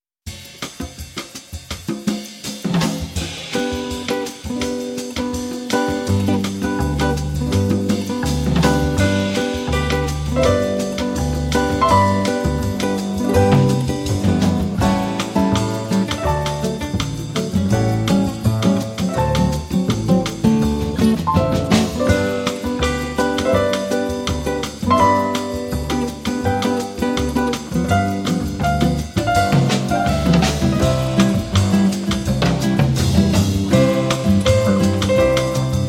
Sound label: In G Major, Jazz Ensemble